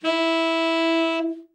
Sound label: tenor soft, sax; Giga Samples Collection